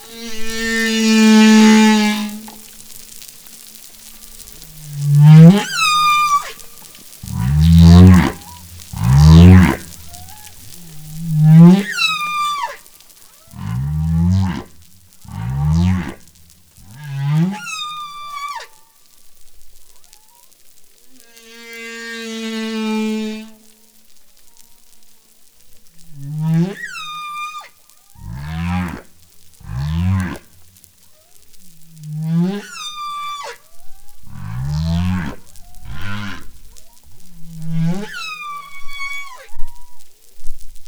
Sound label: whale song